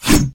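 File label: chopping cane with a sharp machete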